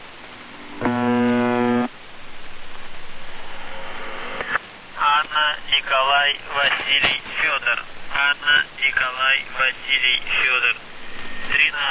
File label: buzzer usb msg